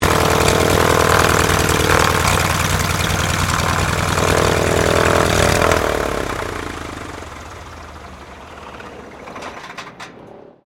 AIRCRAFT CT4A IDLE SHUTDOWN, military trainer aircraft; Airplanes